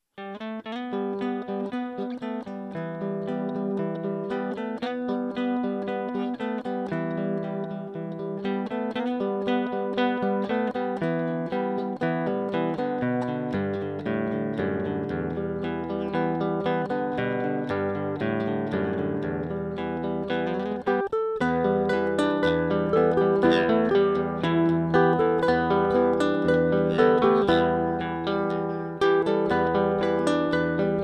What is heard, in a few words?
a fugue, guitar